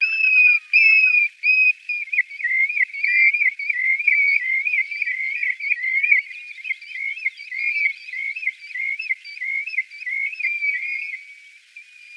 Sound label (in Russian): zolotistaya rzhanka golos